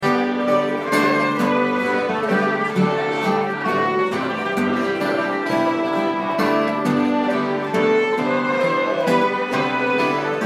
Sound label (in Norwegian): valse